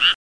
amagaeru; Internet 1996 World Exposition; Japan; NTT

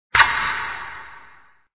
ochiru; japanish fishing

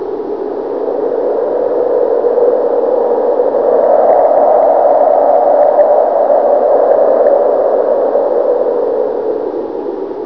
Kaze

